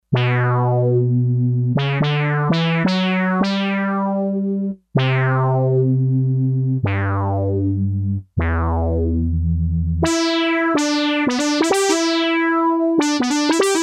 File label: Synth with resonance 4, Sequential Circuits Six-Trak; Factory Programs